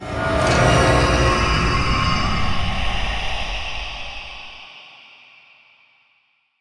Horror Stinger; Warframe; Harrow

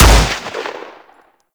Fire Shot, Weapons; Gorgon

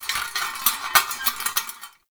Metal Rattle; Props; Warframe; Tau; Ambience

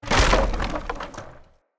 Wood Boarded Wall Small Destroy

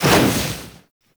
Burst; Warframe; Purgatory Warrior

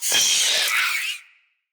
Spider Elite Vocal Death; Root Spider Elite

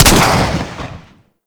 Swarm Missile Launcher Fire 2D Shot; Warframe

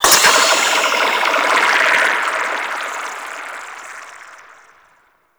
Squid Lancer Ink Blob; Warframe; Grineer

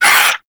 Swarm Vocal, Enemies; Warframe; ManInTheWall; LimbVocals

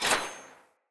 Dagger Melee Whoosh Layer; Weapons; Player; Swords

